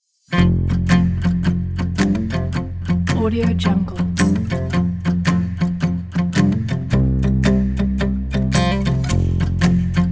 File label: acoustic cowboy